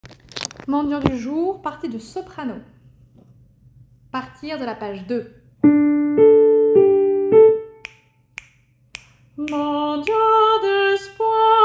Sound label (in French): Mendiant du jour soprano